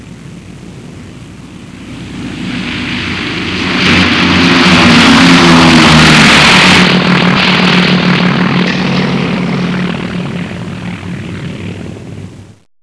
Ww2 Aircraft

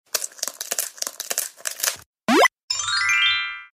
Crack An Egg; Crack An Egg 3

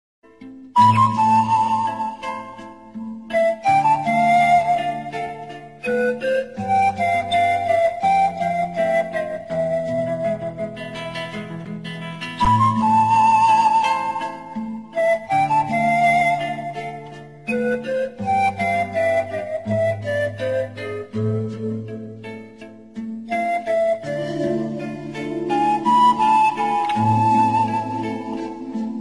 Romantic Flute

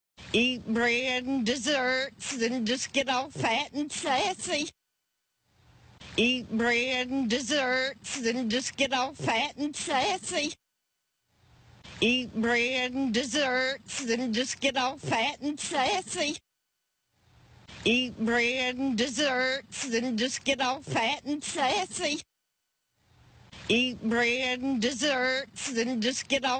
Eating Bread

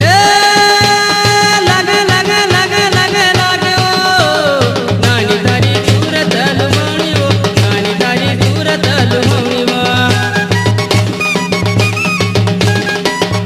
Gujarati

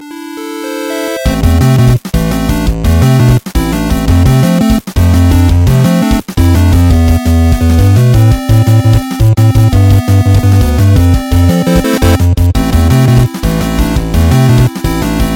8 Bit; Penguindrum Op

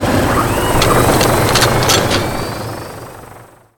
Chopper Gunner

